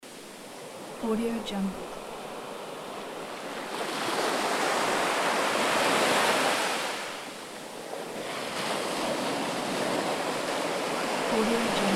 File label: waves crashing